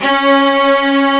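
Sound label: Arco Violin C#3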